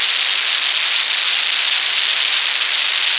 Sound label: Fried Noise; Chill Trap 2 Noises